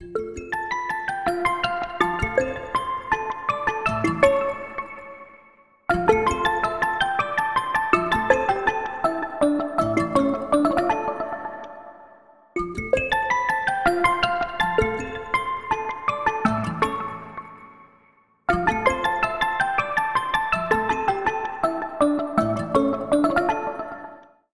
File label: Coin Dance; Honor Pad X7